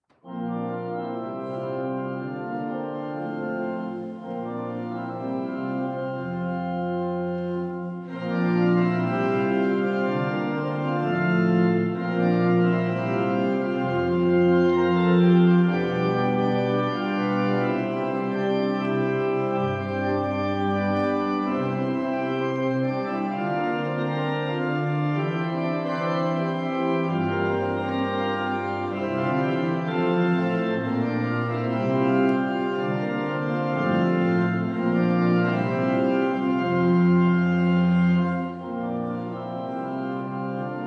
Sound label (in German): Orgelausgang